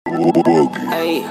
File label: rap; Buggy